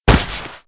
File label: Lion Hit Floor; Playable Tutorial Level